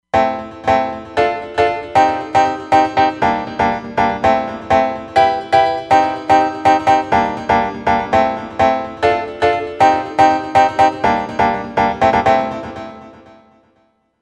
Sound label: House chord, Roland Fantom XR